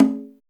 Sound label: CGA, HIP-HOP; Drums In Your FACE!